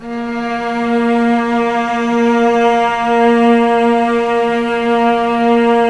A#2 LEG MF, VCS LEG MF E, The Ultimate String Collection